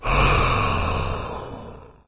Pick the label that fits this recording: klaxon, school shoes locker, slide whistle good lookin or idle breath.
idle breath